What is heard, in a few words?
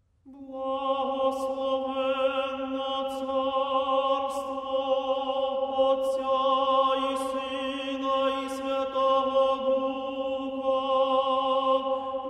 Choir Liturhia; Kyiv